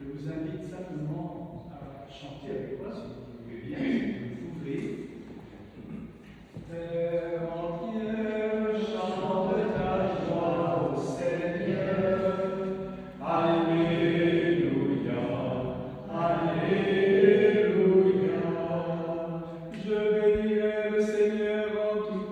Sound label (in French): chant TERRE ENTIERE; LUSSERAY; EGLISE; BENEDICTION CHEMIN CROIX